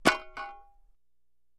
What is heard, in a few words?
Axe Falls Ring Out Axe